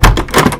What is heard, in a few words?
door open; Minecraft; Votive World